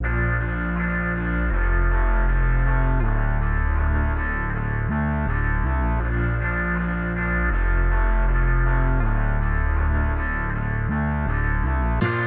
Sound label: 160bpm D Min